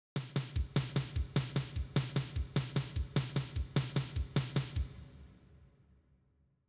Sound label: triplets